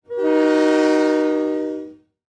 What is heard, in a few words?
horn; mavis; cgi